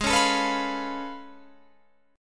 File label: A Augmented Major 9th G sharp